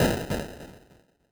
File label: mage death; Incantation